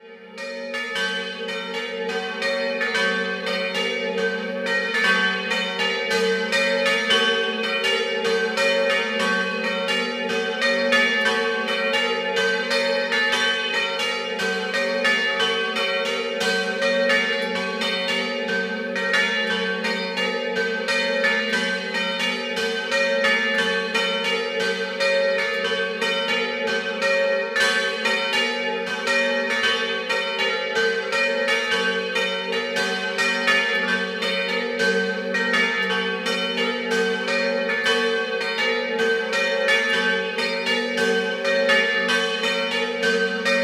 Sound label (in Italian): Caporiacco Doppio, campane